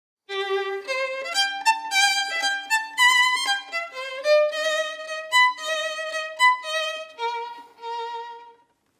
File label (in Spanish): Violín